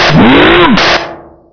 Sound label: zombie attack